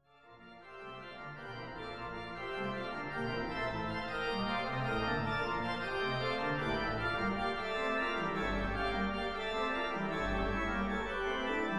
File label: Organ